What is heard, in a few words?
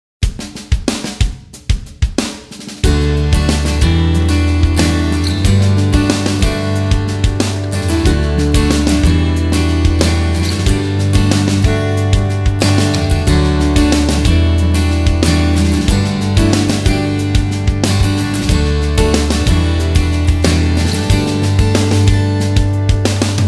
Natural Acoustic